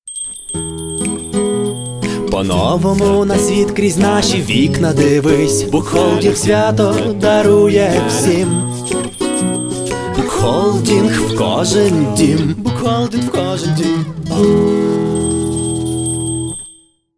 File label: swing; bukholding